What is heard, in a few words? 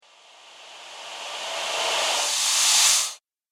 Whoosh With Friction; Whoosh Sound Effects